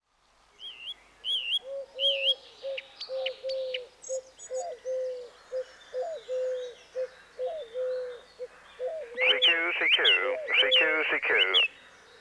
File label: songthrush short